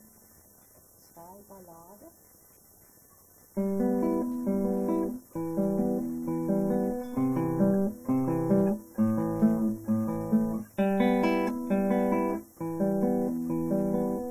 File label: Ballad, Guitar; Scenic Music for Guitar Beginners